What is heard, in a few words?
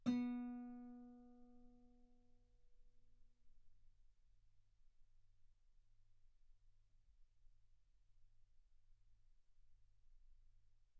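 Guitar ff B string B3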